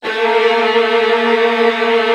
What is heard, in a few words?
VN TRILLS, Virtuoso Strings